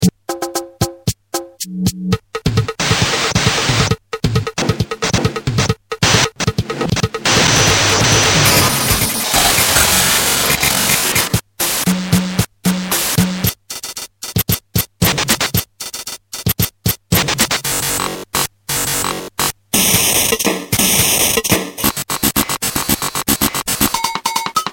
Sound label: dd6